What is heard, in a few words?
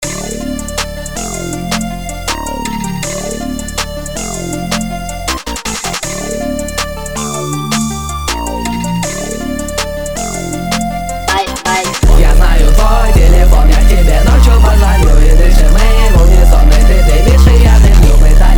intro jazz